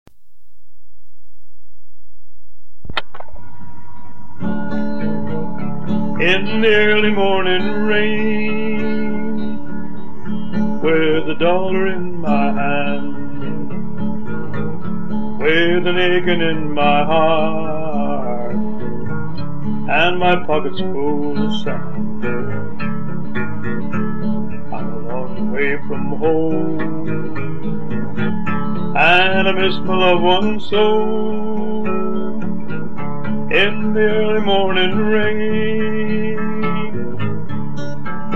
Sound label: Early morning rain